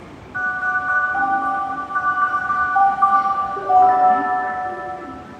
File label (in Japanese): 接近, train sounds; 神戸市営地下鉄海岸線三宮花時計前西